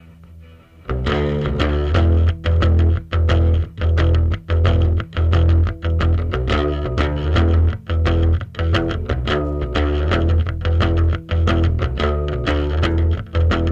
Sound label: bassline